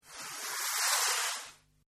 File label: Skiing; Skiing And Snowboarding; Sound Effects:Sports; Partners In Rhyme